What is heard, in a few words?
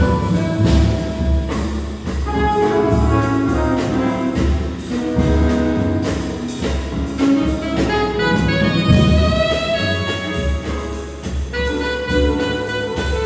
alto